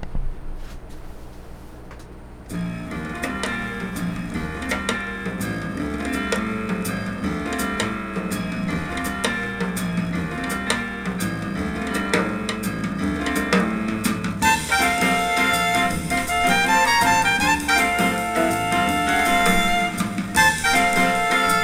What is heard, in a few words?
normal close